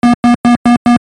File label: Aircrafts Cockpits Warning Tone; DCS